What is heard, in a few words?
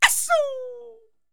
YELP, World Voices, ANDES FX